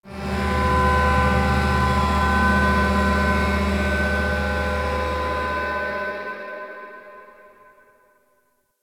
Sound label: Gas storage container movement; Warframe; Corpus Gas City; Ambience